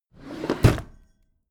packed drawer smooth open heavy

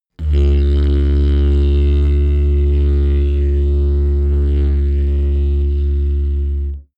didgeridoo straight tone

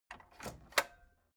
tape deck open

